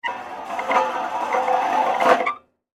Glider Slide, Playground, Equipment, Glider, Slide; Playground Sound Effects; BlastWave